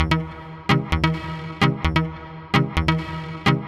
Synth, Techno

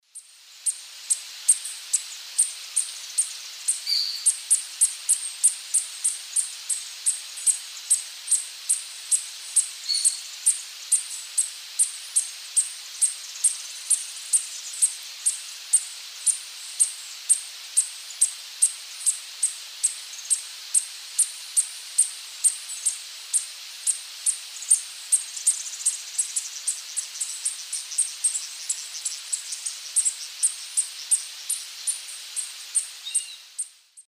Tangara schrankii, dawn song; S. America; Eastern Lowlands

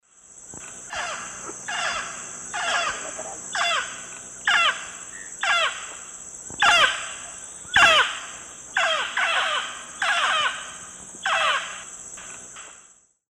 Amazona farinosa, flight calls; S. America; Eastern Lowlands

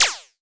Laser FX; Dance; SFX

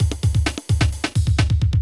electro kit 130

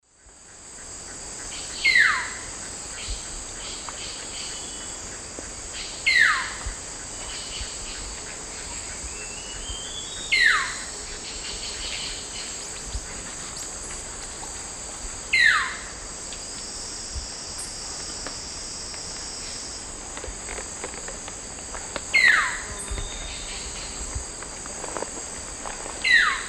Xiphorhynchus guttatus, call; S. America; Eastern Lowlands

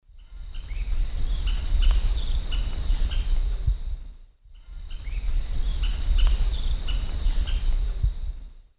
Empidonomus varius, call or song; S. America; Eastern Lowlands

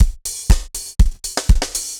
dancefloor tight 120

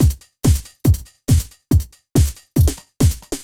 techno 140

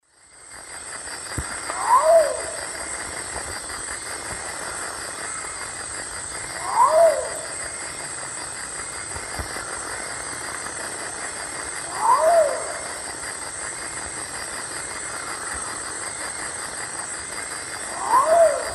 Nyctibius aethereus, song; S. America; Eastern Lowlands

